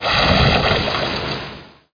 splash; SHADOWS

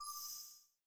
Speech Disambiguation; Vista

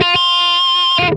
dist wah F4, distorted wah, Guitar; Future Music 10th Birthday 2002

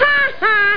bonus Flyer Go Away; F.A.R.M. Patrol

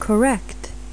correct

